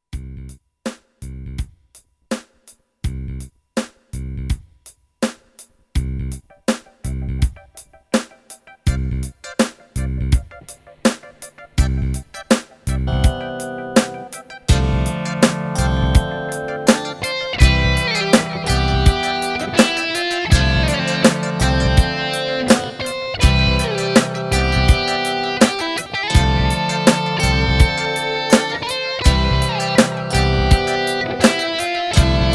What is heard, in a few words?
ballad